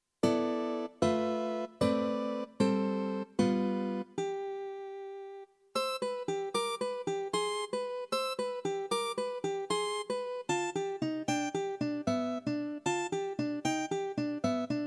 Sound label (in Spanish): Guitarra